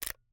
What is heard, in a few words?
Camera, Kinusa K77, 50mm lens, plastic, serrated, lens adjust; Eiravaein Works; Vaeyan IV